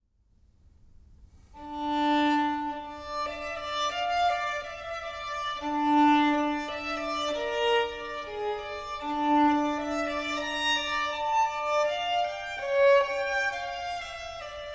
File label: Violin Concerto; Philip Glass; NAXOS